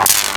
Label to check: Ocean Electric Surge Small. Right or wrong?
Right